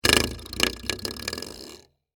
Door Stopper Move, Texture, Door, Stopper, Movement; Miscellaneous Doors; BlastWave